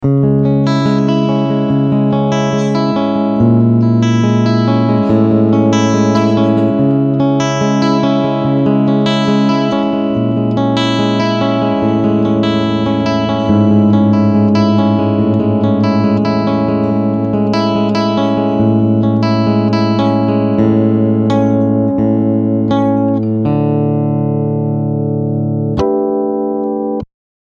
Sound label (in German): Clean picking beide Pickups